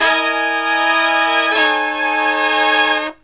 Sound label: SLEEP, Killer Brass Riffs